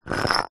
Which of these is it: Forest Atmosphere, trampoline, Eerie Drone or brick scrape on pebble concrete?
brick scrape on pebble concrete